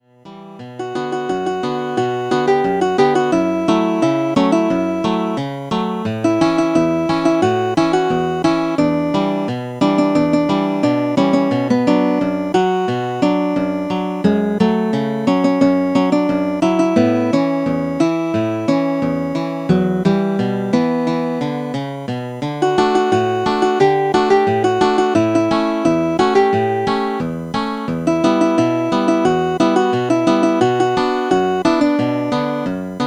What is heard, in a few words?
synth